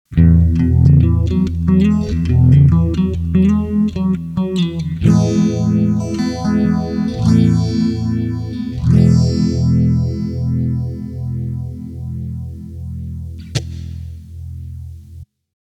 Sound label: clean guitar wet